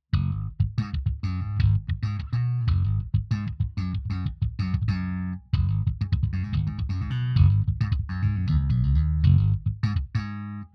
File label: bass, extended drive